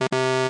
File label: False Middle